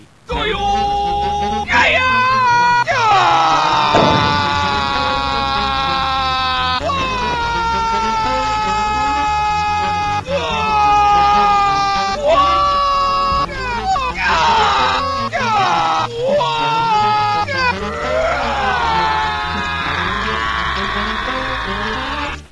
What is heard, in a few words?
waaaughhh